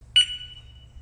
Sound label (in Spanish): activacion, ringtones de alarmas